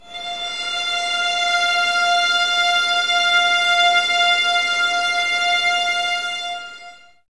VS F 6, String Ensembles, 11 Violins, Sul Ponte; Miroslav Vitous

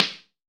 RESO SN, Snare; AKAI S6000 CD-ROM - Volume 4

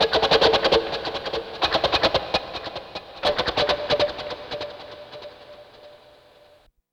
GTR, E.Guitar FX

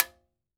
TIMBA SV, TIMBALES; Cuba; AKAI S6000 CD-ROM - Volume 5

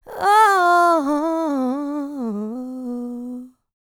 LICKS, Vocal, R&B; ILIO; Dance; LT R+B

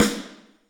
SNARE, WET SNARE; AKAI S6000 CD-ROM - Volume 3

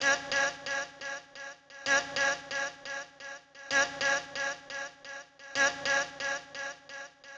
Fx Vox Loop 130; Equipped Music; Loungin House

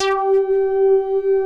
BASS G4; Q Up Arts; Dream Experience

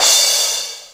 DMX Crash, Oberheim DMX

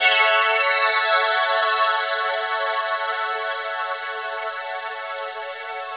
Dream Pad maj 6th; Keys; Pads; Drum & Bass

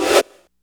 DR660 DANCE, DRUMS PERC; Rubber Chicken Software Sample Bank

